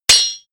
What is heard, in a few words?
sword hits